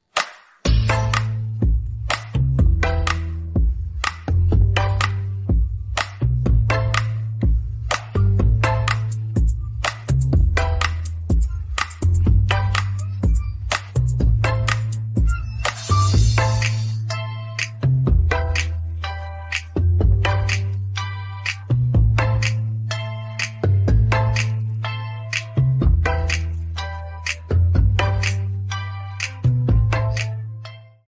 instrumental

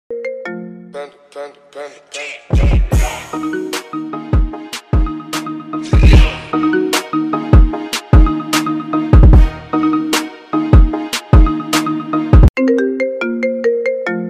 marimba remix

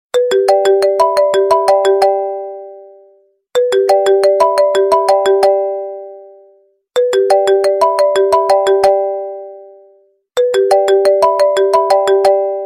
iphone xs max

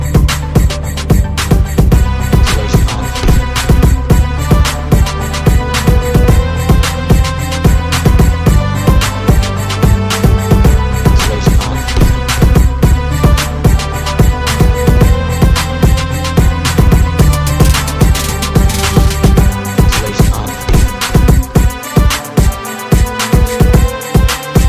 christmas rap beat

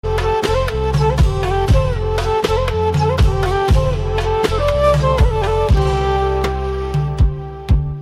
Flute

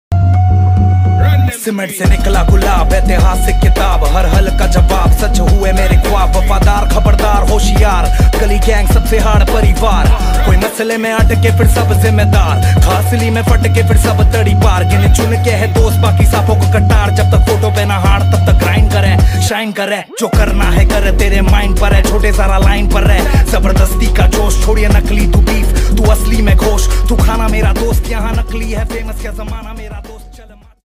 rap ringtone

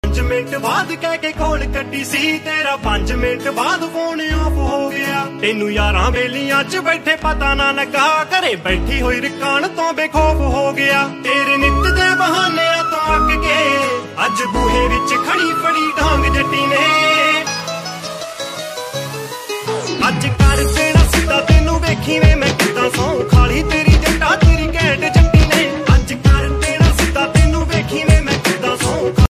Punjabi

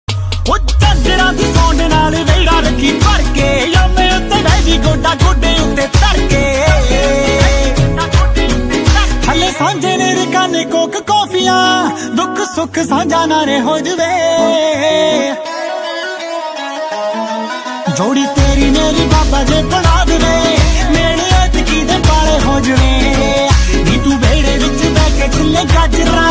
Punjabi

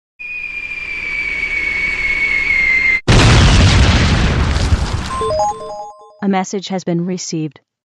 sms tone bomb

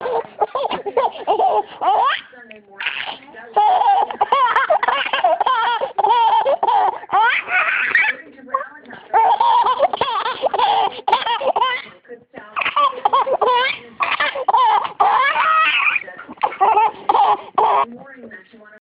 baby laughter

